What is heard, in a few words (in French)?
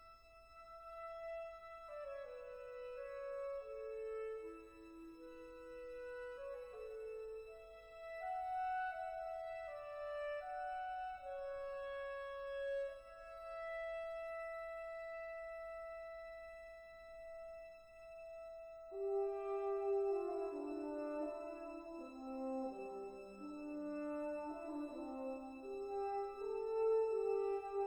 melodie russe; Cours education musicale; Voyage en Russie